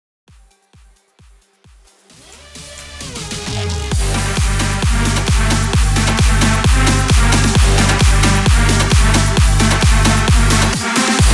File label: VIP Cut 132 BPM Clean